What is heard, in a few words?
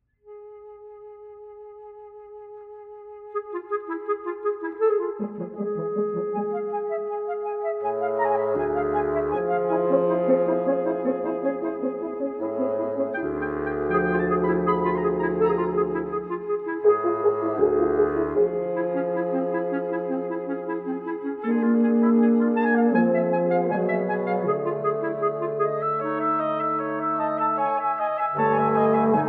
Wind Quintet II Pastorale